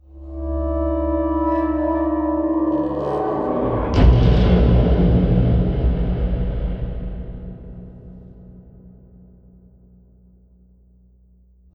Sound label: Jumpscare Door; this is the zodiac speaking 2020 gamerip switch ps4 xbox one pc macos